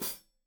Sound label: Hat Foot, Hi-Hat, Cymbals Stereo